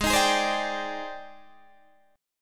G sharp Major Sharp 11th, Guitar DADGAD; G#M#11 Chord

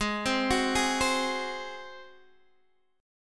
G sharp 7th G sharp, Guitar Standard; G#7 arpeggiated; capo=2

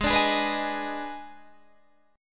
Eb Major 7th Suspended 4th Sharp 5th Ab

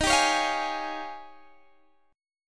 D sharp 11th D sharp, Guitar, Open G

